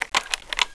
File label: lock; duke nukem total meltdown